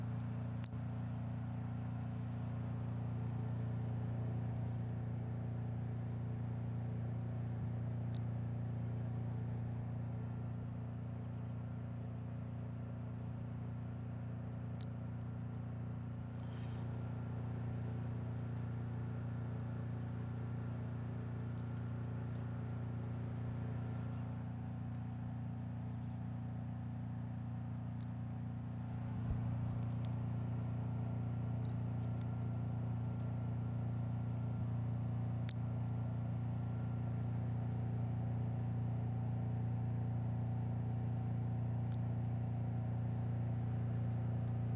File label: Brachycephalus albolineatus